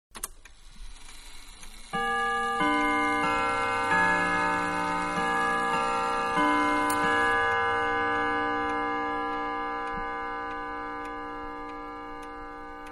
Clock Grandfather-12 Hour, Tune 3; Clocks (Sound Effects:Machines); Manhattan Production Music

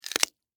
Finger Break Bone, Horror; Blood And Guts Sound Effects

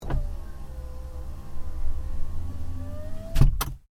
Window Close, car; Hyundai Accent 2008; Hyundai hire car; Vehicles

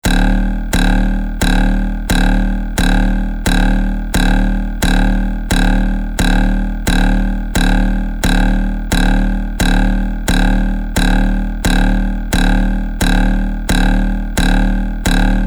Looping multimedia comic boings; Comic Bounces; Cartoons

